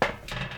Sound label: Truck sprint; Phasmophobia